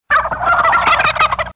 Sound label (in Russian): indeika massovii korotkii blizkii zvonkii